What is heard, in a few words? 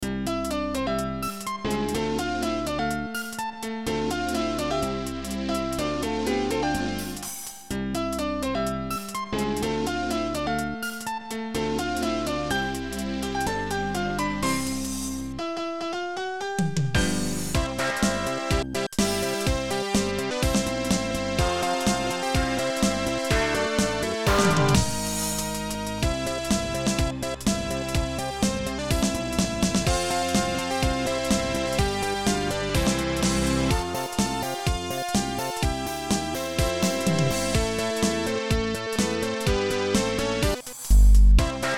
Jingle bells remake